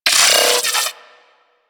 Arachnoid Micro Vocal Pain; Warframe; Corpus

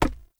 Unarmed Punch Impact Wood Hollow Large; Weapon Impacts; Player; Environment

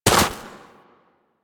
Walk Snow, Footsteps; Warframe; Operator

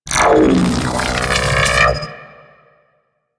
EMP Blast Charge Up; Enemies; Weapons; Warframe; Corpus; Arachnoid Camper

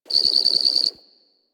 Garden Animal; Warframe; Clan Dojo; Dojo Garden Animals

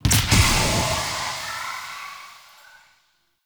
Balloon Destroy; Encounters

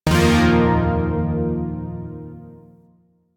Shawzin Pent Min Chord E; Chords; Warframe; Zariman

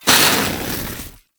Smithy Forge Fire Coal Burst; Soul Plane

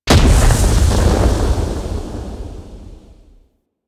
Void Arena Ghost Comet Explosion; Warframe; Quests; Wraith Quest

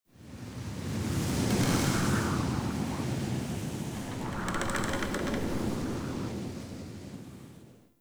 Root Cave Skylight Large Wind Gust; Ambience; Props; Neathuns Root Cave

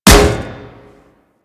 Staff Impact Metal

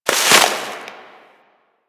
Tree Branch Break; Warframe; Quests; TheNewWar; Ambience; Drifter Camp

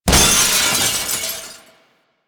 Glass Window Break; Warframe; Ambience; Nineteen Ninety Nine

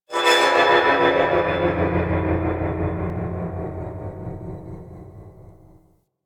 Metal Groans And Scrapes; Ambience; Warframe; Grineer Asteroid

